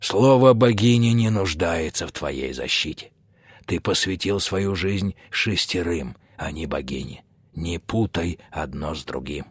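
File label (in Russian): garmon, accordion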